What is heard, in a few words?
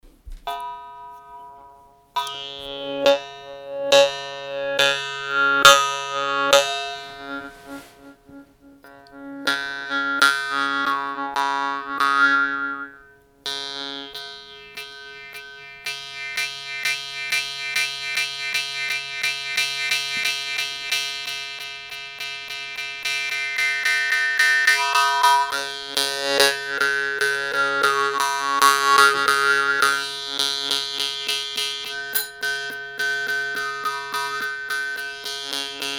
d3 146.83 hz